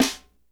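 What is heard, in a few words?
snare drum, acoustic drum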